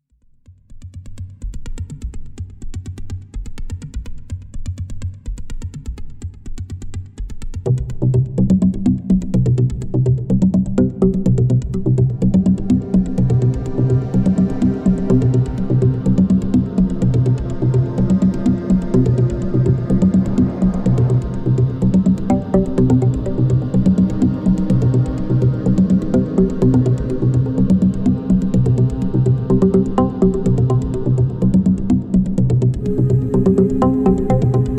AMBIANCE CARTES

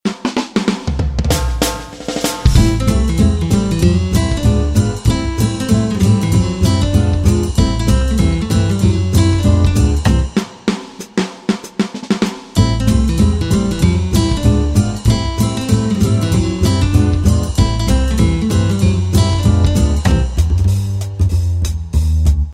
MANOUCHERIES

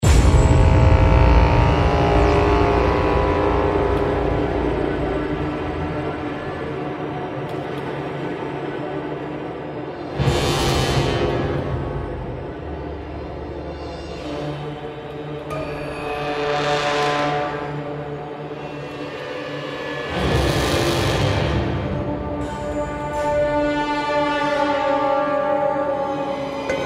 série suspence étrange

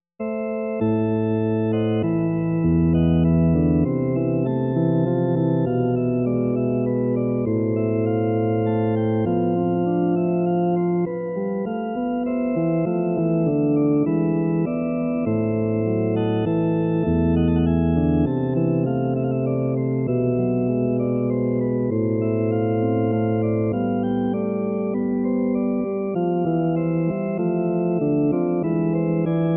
SARABANDE GRANDES ORGUES

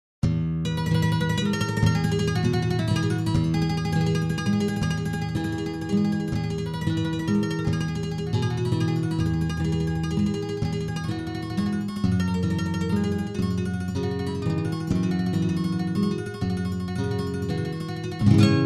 ARPEGIO DANS LA NUIT

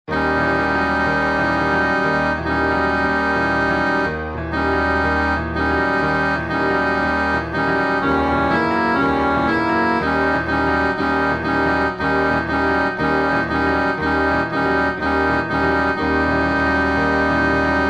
DISSONNANCE

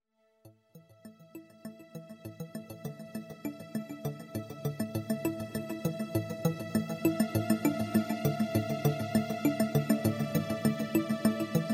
AMBIANCE PARLE

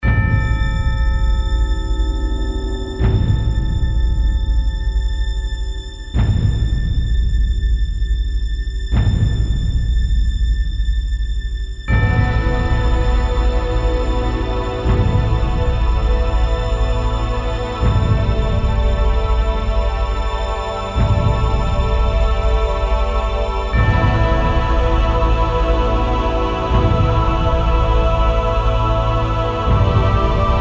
série suspence planant

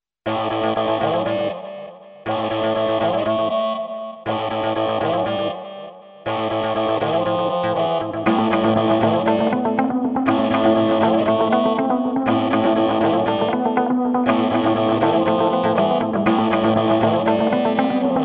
AMBIANCE DENTELLES

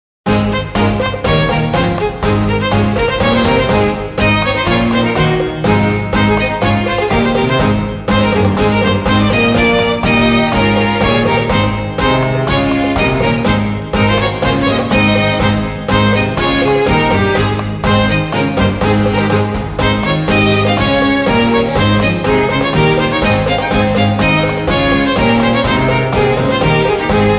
FETE VILLAGEOISE

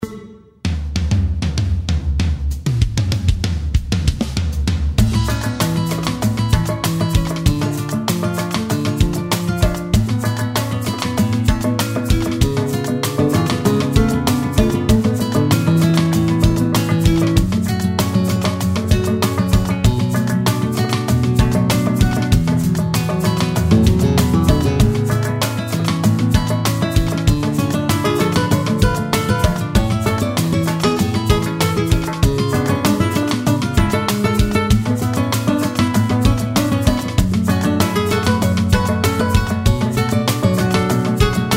bossa équilibrée

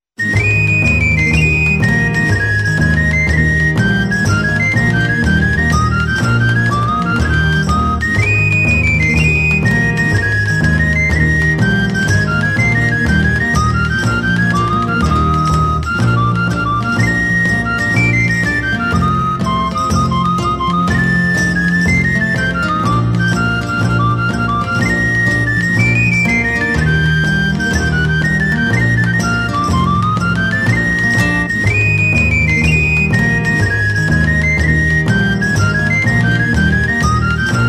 FARANDOLE DE PROVENCE

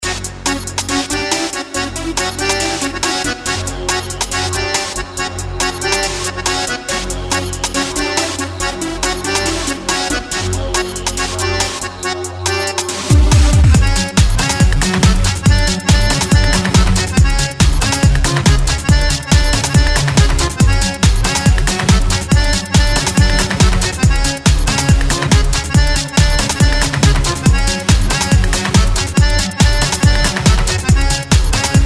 TEKNO ACCORDEON

